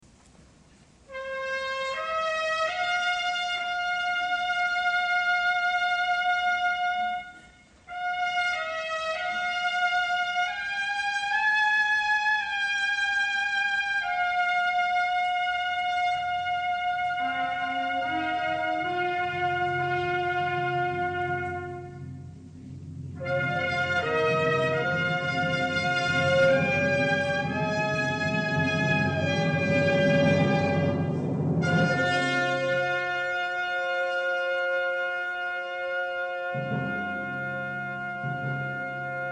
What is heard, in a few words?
Fanfare